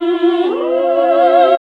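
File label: classical singing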